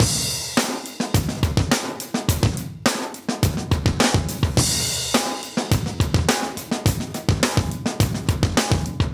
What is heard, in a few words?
Live Breaks, 105